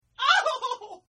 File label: Shriek; Screaming; Voice; Partners In Rhyme